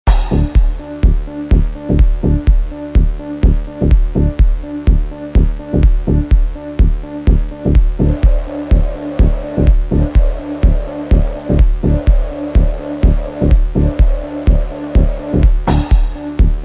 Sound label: Afro Drum